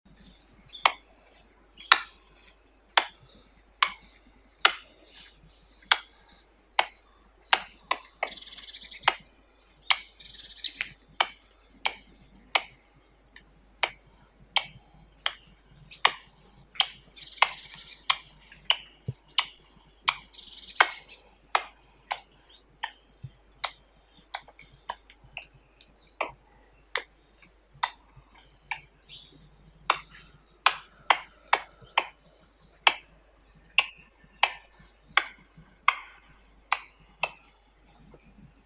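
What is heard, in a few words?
red squirrel, Sciurus vulgaris, alarm; belka obiknovennaya; trevoga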